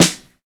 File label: bright acoustic snare sample f key, acoustic snare sample tuned to the F note; snare drum samples